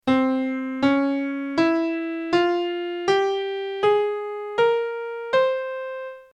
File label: flamenco